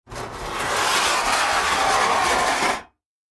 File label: Industry Sheet Metal Scrape Metal Surface; Factories; Backgrounds